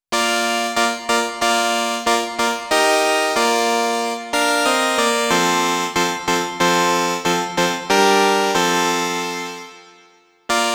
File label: Quiet Room, City Hall BGM; Genso Suikoden II